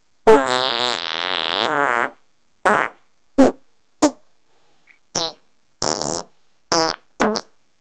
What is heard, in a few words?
farts